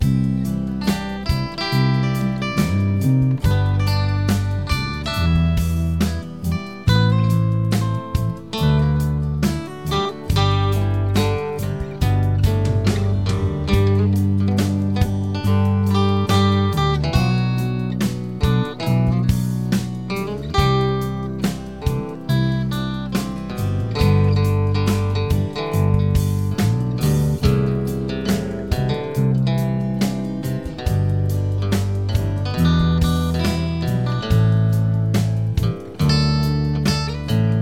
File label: guitars; Solo Guitar Music